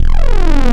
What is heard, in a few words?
Flange Square, wavetables; Animoog